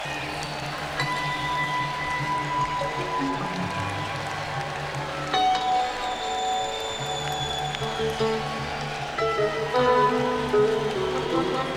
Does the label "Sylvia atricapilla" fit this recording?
No